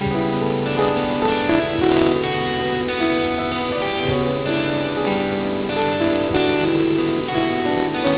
musical

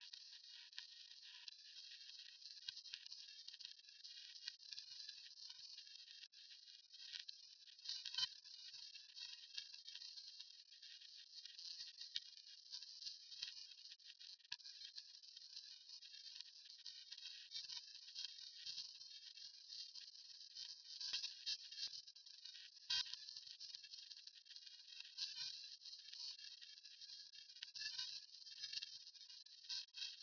Jazz

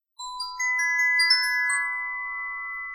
denoising glock 0dB mix

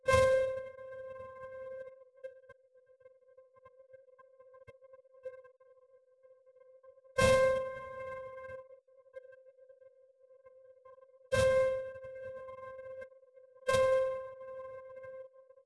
piano NMF

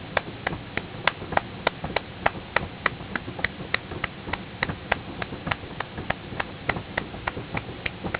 percussion

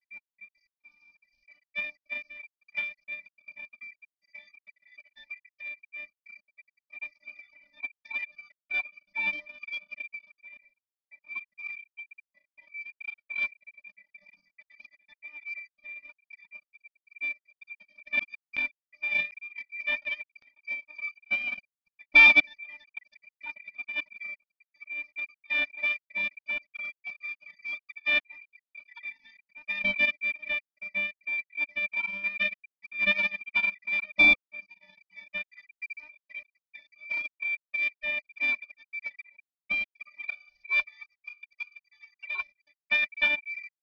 Jazz

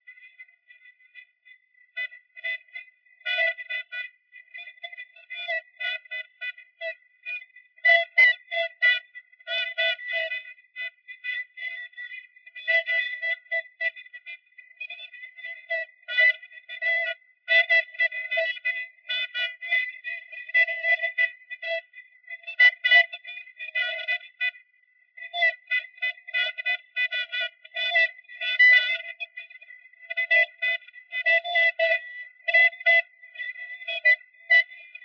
Jazz

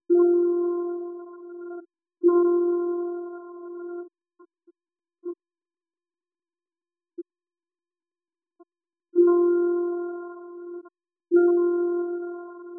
piano 1 layer

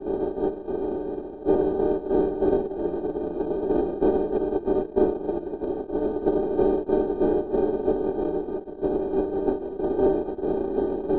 Jazz

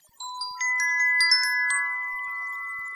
denoising glock 0dB mmse

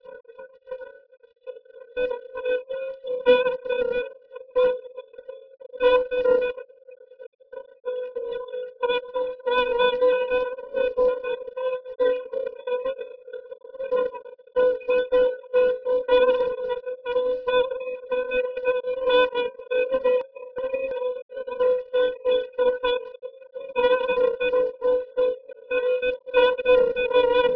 Jazz

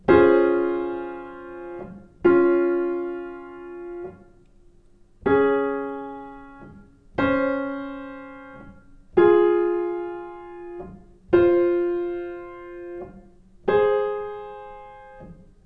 Piano

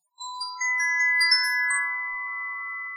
denoising glock 0dB mmse

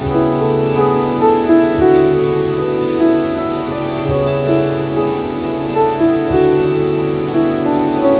musical

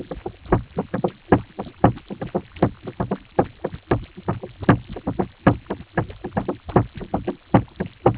percussion

